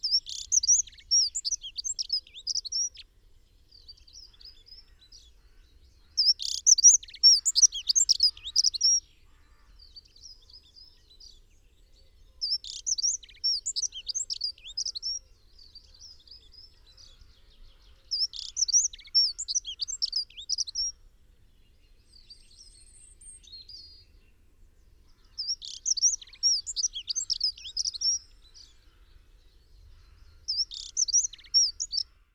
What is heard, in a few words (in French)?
accenteur mouchet chant